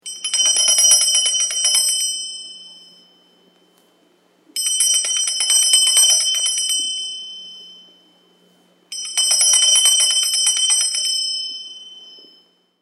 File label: Indian Bells Bengal; Musical